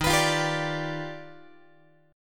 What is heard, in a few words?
D sharp Augmented Major 9th D sharp Guitar; strummed; JGuitar